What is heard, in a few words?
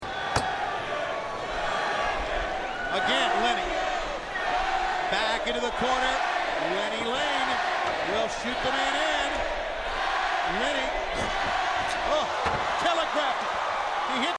maggot chant